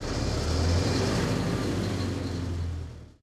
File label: engines; World of Tanks